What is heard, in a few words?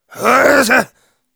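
pain sharp, German; Demoman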